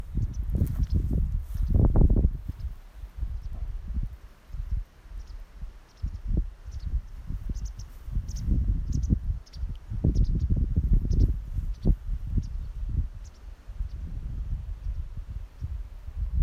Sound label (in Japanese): hakusekirei